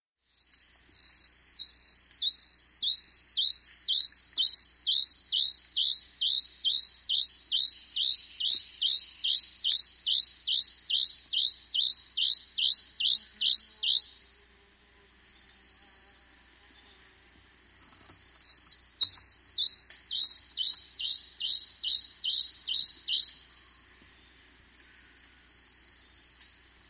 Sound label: Plain-Throated Antwren; S. America